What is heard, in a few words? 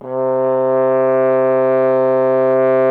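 brass trombone C; Miroslav Vitous; Solo Instruments VOL-1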